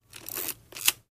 Antique Camera Film, shutters; shutters & movement

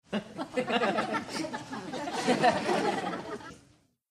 Crowd Light Laughter, medium

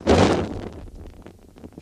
Flame Burst Quick

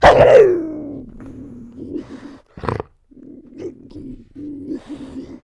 Bobcat Snarl Vicious, big cats bobcats

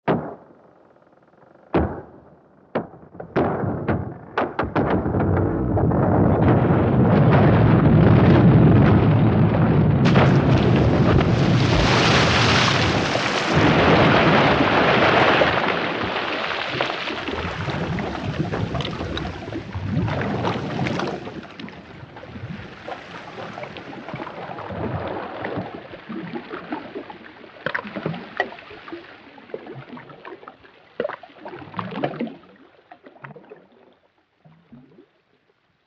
Tip Glacier Break Sea, breaks exterior

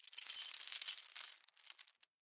Heavy Chain Movement

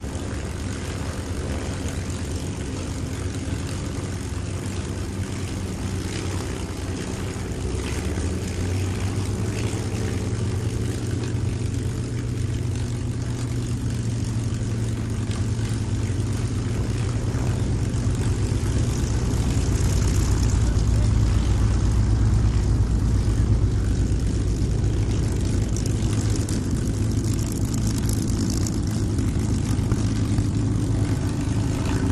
Multi Planes On Field,More Active Area; ww2 fighters

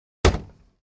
Fishing Boat Hatch

